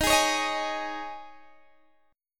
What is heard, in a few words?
Ab Suspended 4th